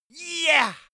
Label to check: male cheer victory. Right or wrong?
Right